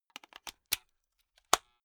insert safety plug springy; objekte; elektronik

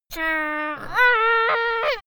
little girl play; soundpool

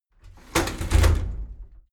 shower slide door inside close heavy halfway

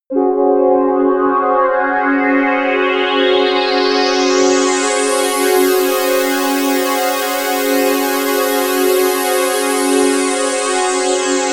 strange place, music elements

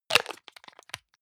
hygiene small screw cup close hard; haus-garten; badezimmer

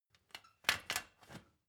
step ladder metal scrape single

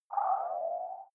game start dull

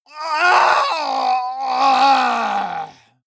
male die gurgling; soundpool

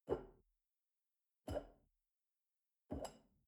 shell upside down grab from table soft; berufe; gastronomie; soundpool

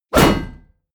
hit shield metal; hits explosionen; metall

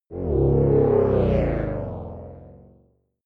break down; energie

